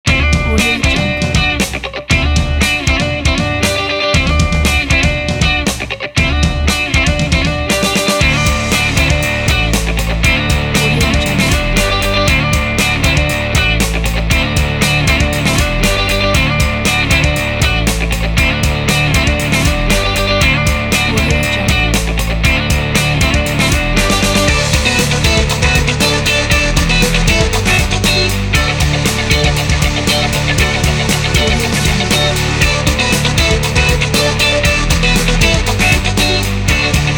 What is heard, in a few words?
funky style